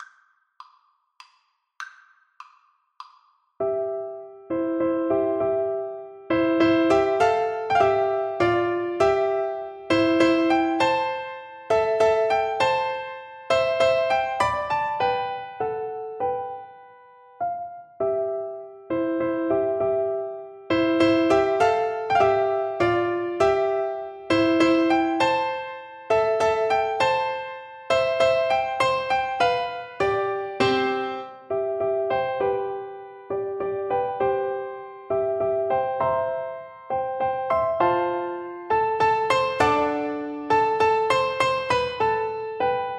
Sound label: Piano Duet